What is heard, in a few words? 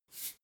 salt shake single; berufe; gastronomie